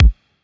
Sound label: Kick, Synthwave; Drum Samples; ColourBurst; Futurism; Synthwave Loops